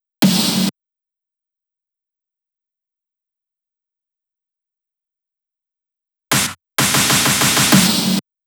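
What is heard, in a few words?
Drum Loop snare Fills, 128BPM; VEC5 Drumloops; VEC5 Multi Loops 128BPM; Loop Elements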